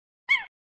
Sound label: Monkey sound; Monkey sound (attract random sound with flipper buttons)